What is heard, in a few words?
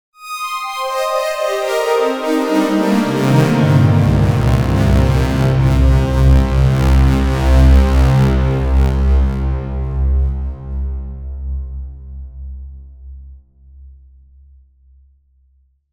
Kobol glass